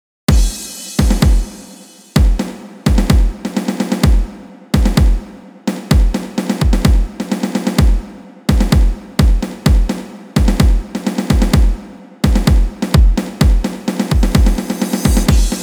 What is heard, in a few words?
DrumSolo